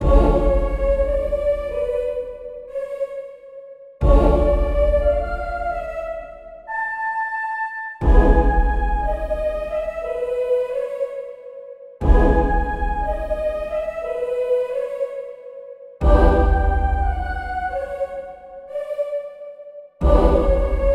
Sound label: intense relaxation